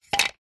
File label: wood pieces small set down